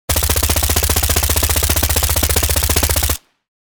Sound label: Sterling submachine gun full auto fire; war